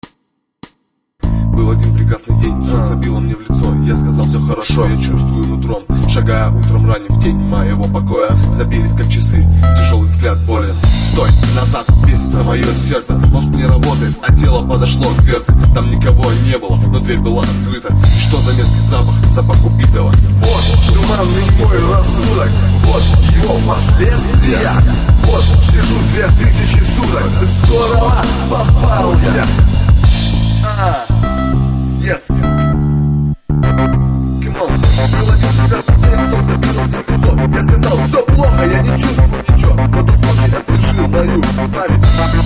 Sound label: rap